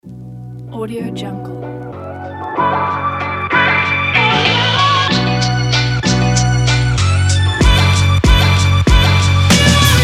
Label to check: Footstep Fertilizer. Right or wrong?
Wrong